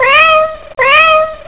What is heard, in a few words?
CAT